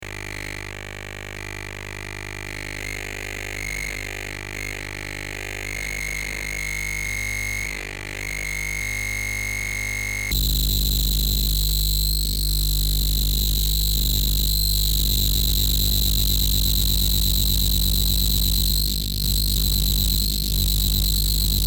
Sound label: guitar noise